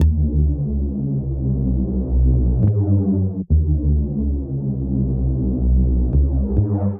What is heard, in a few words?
Bass A Minor 137 bpm; Ableton; Factory Packs; Skitter and Step; Night Tube